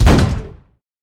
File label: Gunboat shooting